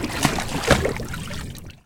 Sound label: water splash; impact; physics; hl2